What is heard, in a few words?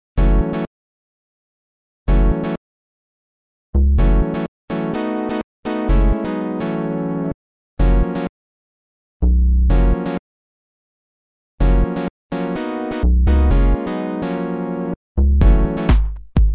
electronic fog; dense fog machine